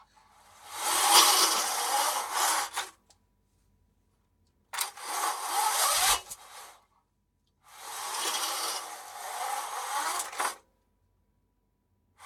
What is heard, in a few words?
blind; sonniss gdc